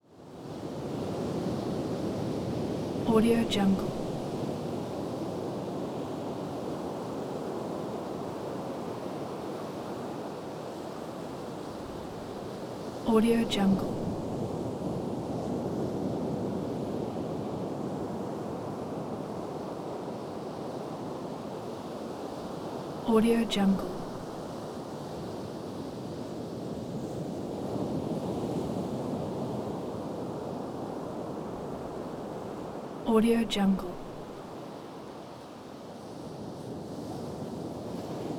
surf soft periodic waves ocean roar distant; prosoundfx

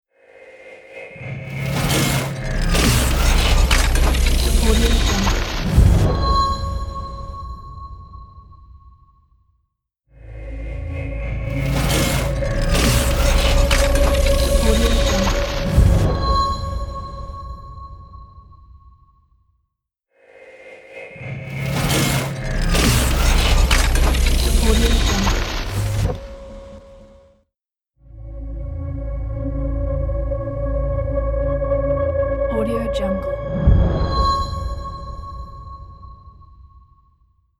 robotic transform logo

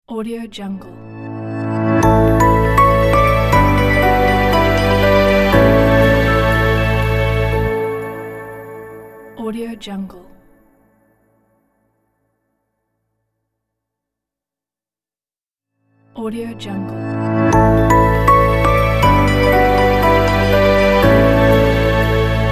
logo

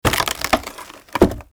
large wooden crate pry open

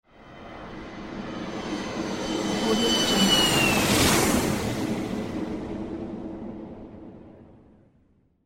airplane takeoff

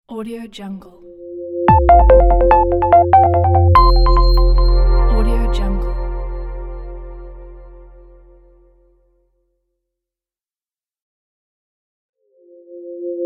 minimalistic logo

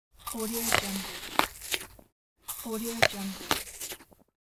eating apple

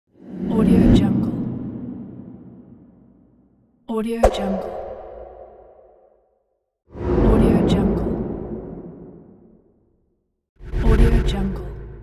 air wooshes transition; quattro-boom

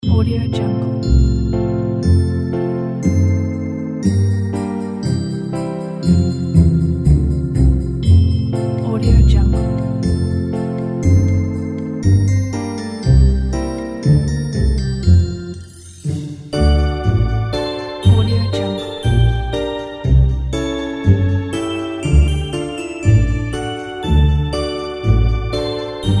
new year background music

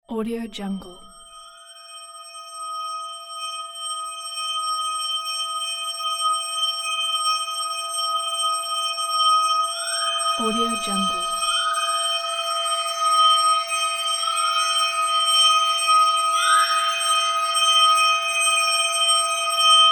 eerie horror ringing atmosphere

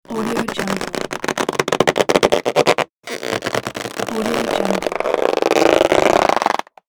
stretching of rubber baloon; noisesonic

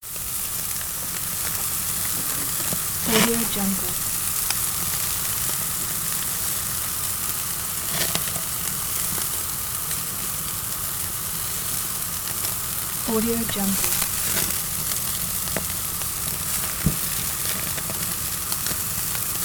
sizzle continuous

